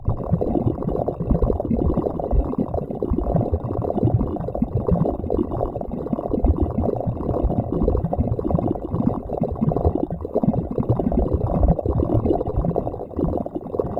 Power Core

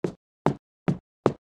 Plump Walk Loop; Hollow Knight; Ruin Royal Plump Walk Loop

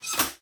Drop Plat Reset

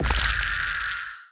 Death

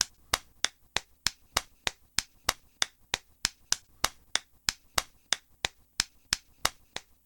wood steps

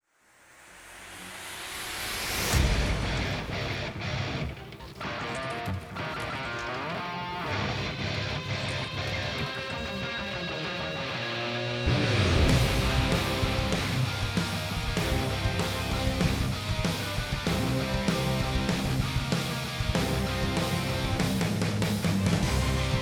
Event Suspense Light

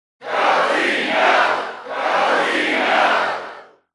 Sfx American football

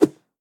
Sword Air Swing

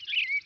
Music Frontend

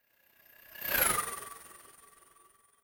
Spell Drone; Engineer

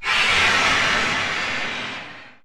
Scream; Grimm

